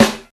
Short Snare Drum Sound A Key, snare tuned to the A note; snare-drum-samples